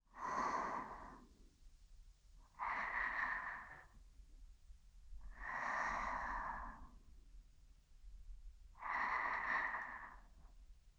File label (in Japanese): すー……すー……うにゃ……。